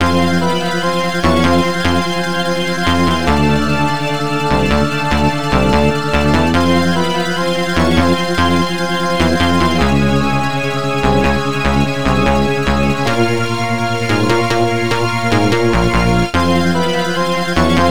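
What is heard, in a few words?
background music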